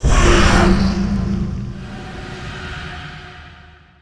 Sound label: summon familiars; visage